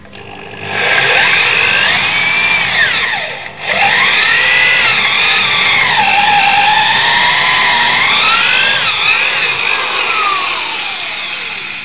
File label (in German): Bohr